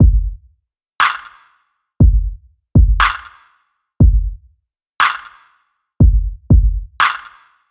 Jungle Kit C; Electro Dub

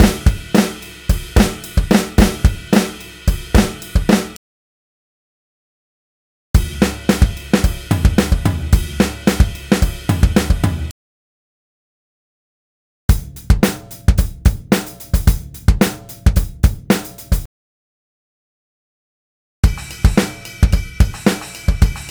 Southern Rock Drums 110 BPM; Ударные (Drum Loops)

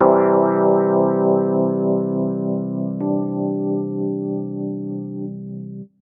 EP Pad

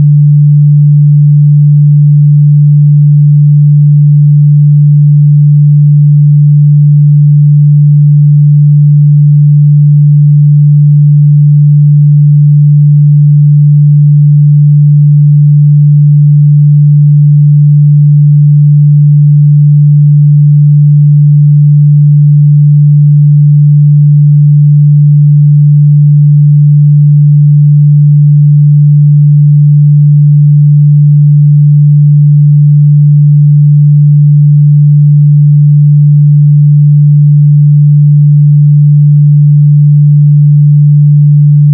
Banjo G 146.832

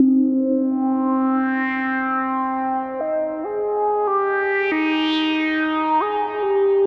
Moog Lead, техно транс

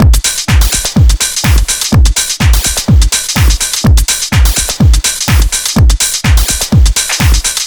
Zippel Beat C, dance

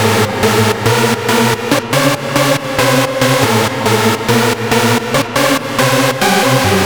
Deathlead; Звук синтезатора; Hardstyle Synth; 140BPM; loop

